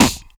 VOC DRUM, MOUTH PERC; Best Service Hyper Dance

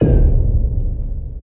Low Drop; Jungle; FX; Jungle Warfare 1&2

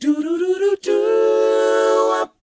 DOWOP B, Vocal R&B DOO WOP; ILIO; Vocal Planet

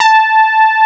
SB HORN; Wizoo - Powered Nord

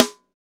SD, KIT Real-Kit; 90 s Sample CDs; Sound & Vision - Gigapack I CD 1 (Roland); Real-Kit m 4

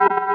Perc; M8; Electronisounds

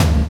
Circulate Kick; Sonic Foundry; Drums & Drones; Construction Kits

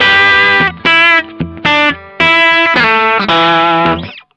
BLUE RIF, Vintage Blues Guitar; USB Soundscan vol.22; AKAI

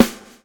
SWNG

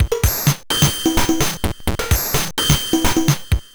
Bent TR505 (128BPM), Circuit Bent

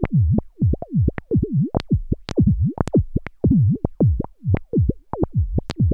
CLICK BUBBLES; Zero-G Ambient 1; CLICK+PULSES

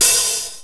HH OP, Drum Dry; East Collexion; Drum 1

